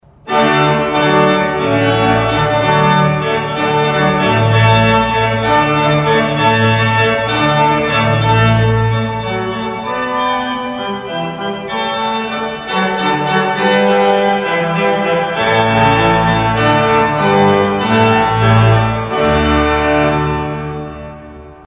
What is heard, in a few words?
hornpipe water; Handel